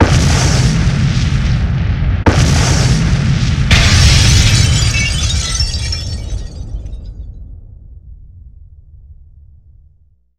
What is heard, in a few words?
Heavy explosions with glass shattered